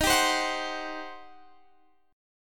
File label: G Major 7th Suspended 4th Sharp 5th, Eb